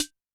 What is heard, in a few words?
Ana Vel, Snare, Yamaha DTXpress-II